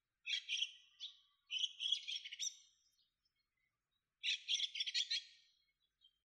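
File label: mukudori call, white-cheeked starling; nakigoe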